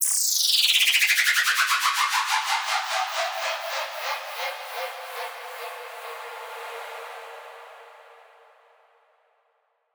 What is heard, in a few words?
Downlifter